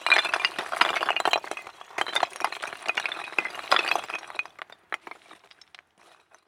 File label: rocks rolling; earthquake